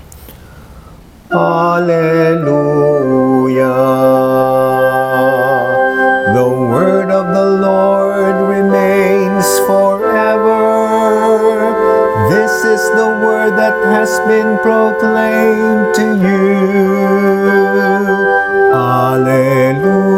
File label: Alleluia Verse; OT 27th Sunday